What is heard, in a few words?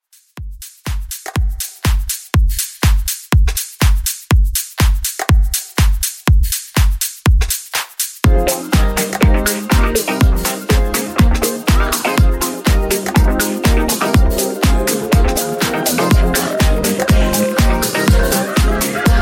Extended Mix 122Bpm Clean